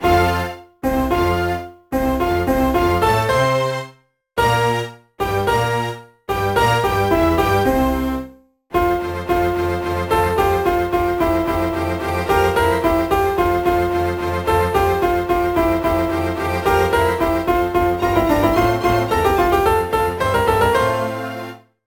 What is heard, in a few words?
BGM ORCHESTRA